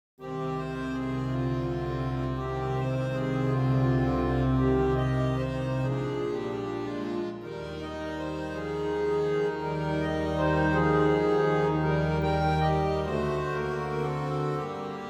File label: Allegretto Cm, harmonium; P Posthumes; Franck